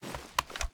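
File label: sniper reload empty fast end; gmod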